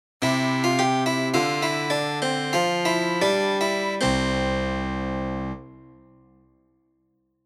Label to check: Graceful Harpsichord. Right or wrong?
Right